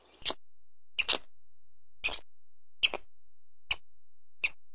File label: Squeaking